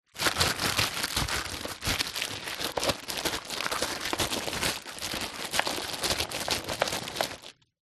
foley packet wrapped plastic handling

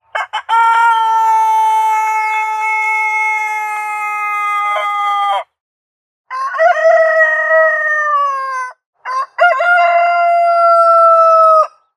Rooster; soundbits

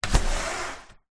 household window slide up open; zapsplat

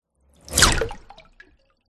Cinematic Water Whoosh

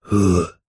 human male middle aged says ouh breathy; zapsplat

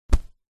clothing thick jacket punch sweetener; zapsplat

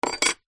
medal metal set down on table

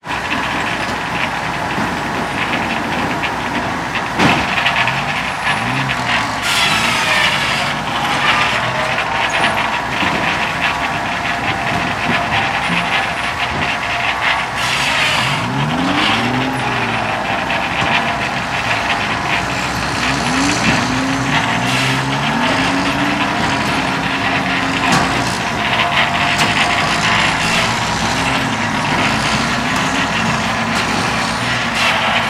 industrial logging saw cutting log; sound effects one

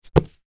foley money notes bills wad throw down on surface; zapsplat

